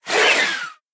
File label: Pegomastax sound